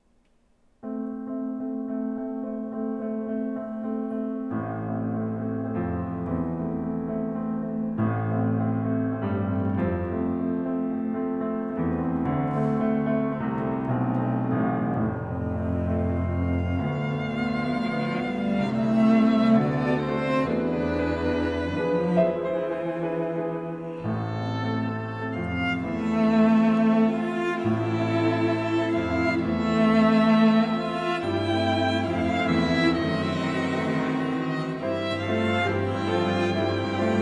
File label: Piano Quartet in A minor